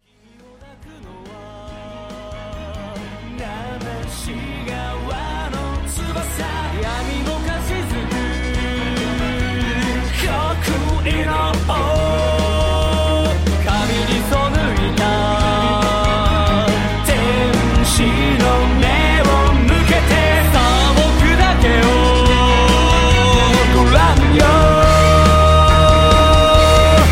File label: Captivating Drama